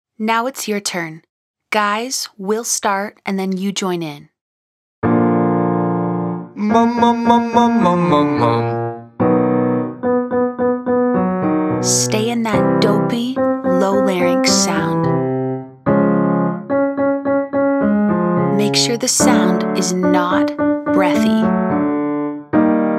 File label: Mum Mum Mum Octave Scale Male